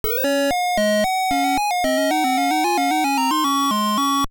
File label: Game Start; Ms. Pac-Man; Atari 7800